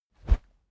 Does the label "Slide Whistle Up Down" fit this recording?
No